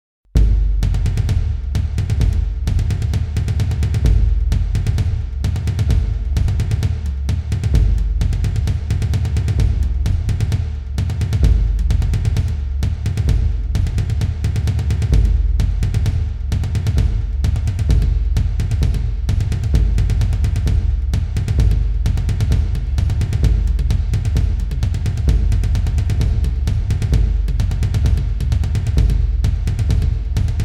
Combat

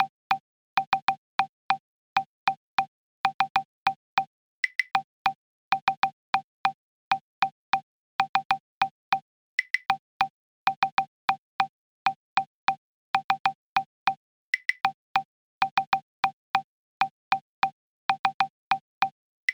Cowbell Perc loop 8 Bars; Vostok Inc.

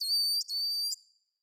Rat Squeaking; Prison Architect

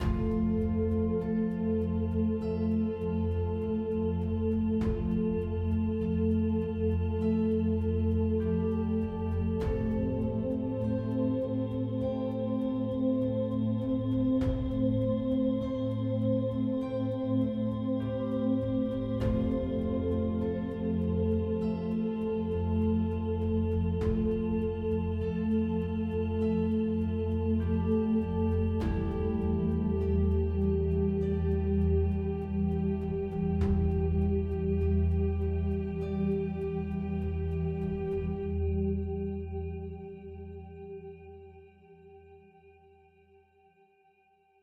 Plaza Bench Loop; solo islands of the heart switch 2019

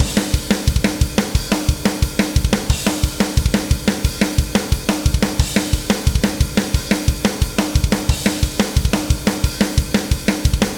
Drums and Jingles Battle DRUMS

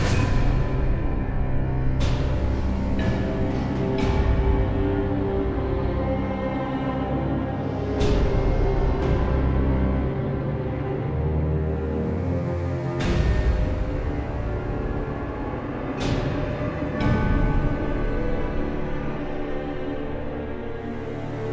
intro countdown; heroes of order chaos; android ios mobile gamerip 2012; underrealm ruins

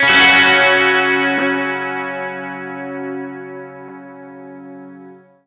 Lake Clean F sharp 3; torchlight iii; ps4

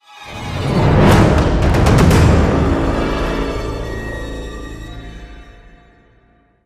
Combat Intro

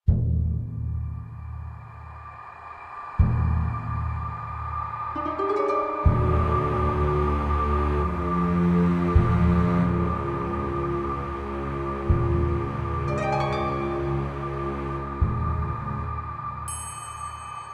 Music Dark Spooky

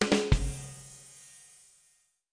Action Stop; Curious George Downtown Adventure

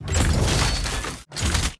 Ui Card; Archeland

